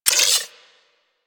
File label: Arachnoid Wraith Multi Gun Overcharged Fire; Warframe; Corpus; Abilities